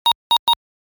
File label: Beep And Bleep Sequence; electronic sound effect; beeps, bleeps, blips